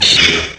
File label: pain; opentdm; Darth Maul